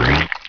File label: buzzsaw rip; Emperor - Battle for Dune